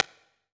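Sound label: Snare Stick; drum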